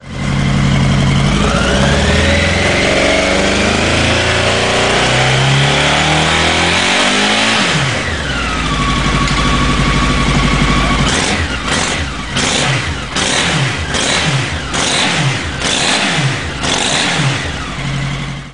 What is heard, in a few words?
muffler, bike; x4